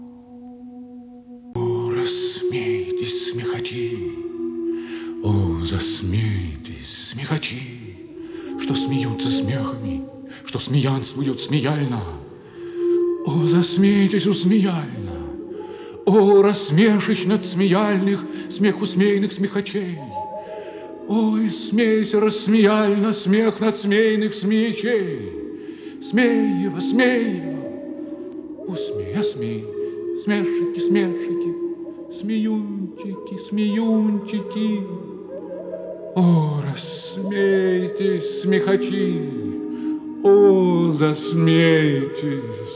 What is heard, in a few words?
laugh